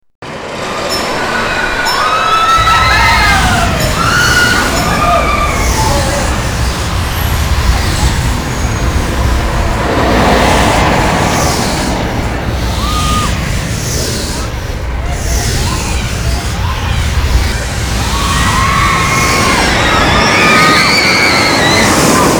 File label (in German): Atmo; EuroMir